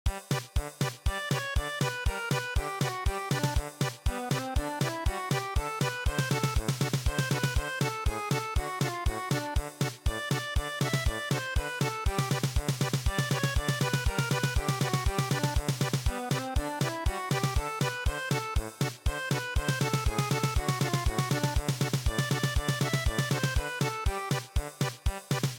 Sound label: Mexican hat dance German Polka